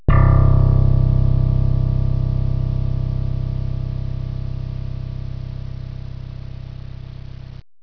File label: BASS NOT; INSTRMNT